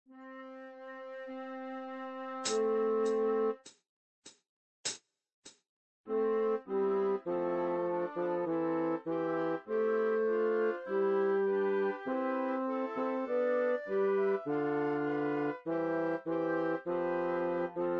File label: example bass; The first soprano, second soprano, tenor and bass variants have their own notes emphasised, in a corresponding way; Partability